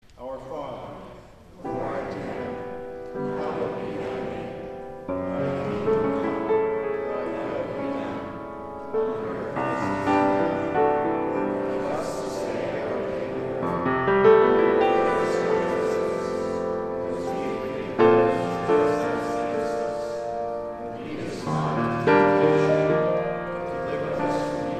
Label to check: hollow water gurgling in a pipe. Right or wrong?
Wrong